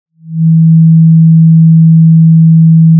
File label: Sound of a cruise ship horn